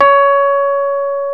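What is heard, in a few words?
piano Rhodes Mk.V; Roland LCDP10 Keys of the 60s and 70s 2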